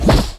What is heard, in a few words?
FX Scratching; Vengeance Samples